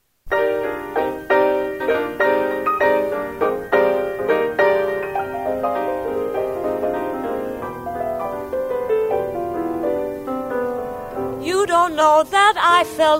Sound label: 2 pianos 78t